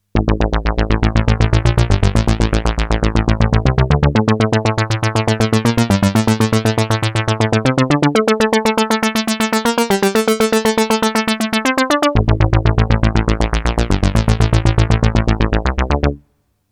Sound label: synth